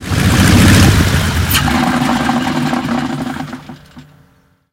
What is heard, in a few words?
yak50 stop, engines; War Thunder